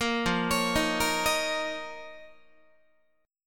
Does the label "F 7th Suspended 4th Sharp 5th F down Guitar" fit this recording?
Yes